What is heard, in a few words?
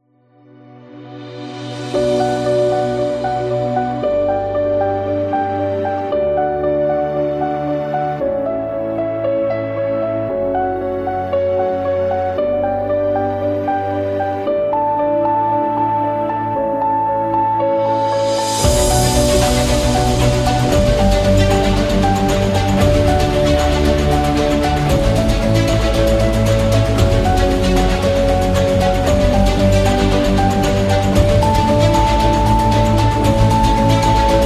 Cinematic Adventure